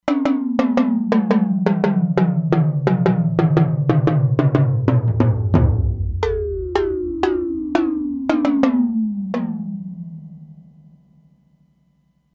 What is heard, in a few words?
Syndrums, E-mu Proteus-3; Factory patches